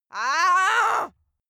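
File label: female angry, screams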